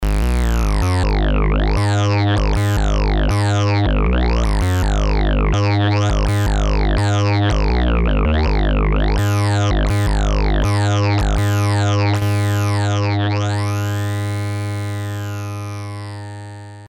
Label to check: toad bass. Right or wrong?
Right